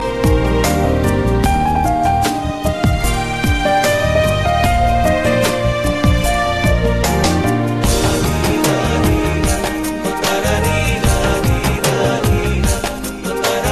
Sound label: Navya Music